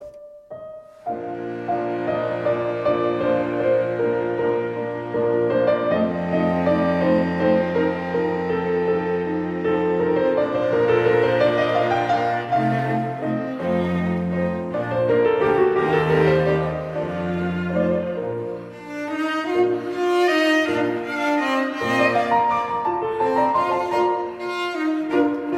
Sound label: Piano Trio (Allegretto) in Bb; Ludwig van Beethoven; WoO 38